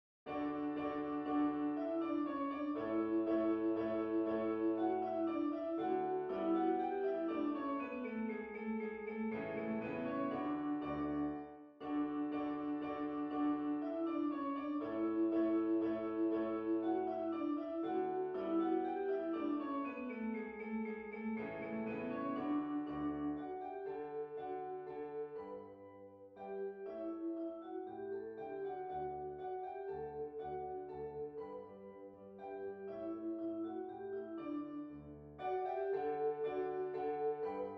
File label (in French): danse allemande, ré majeur; haydn joseph